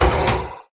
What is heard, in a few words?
hit, irongolem; mob; Minecraft